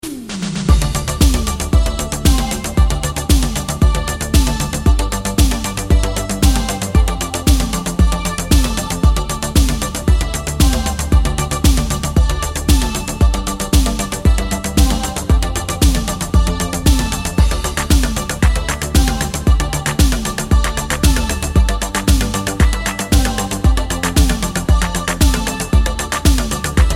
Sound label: Overdrive; D.J. Version